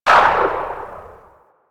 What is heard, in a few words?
Electro Mag Tail, Weapons; Warframe; Corpus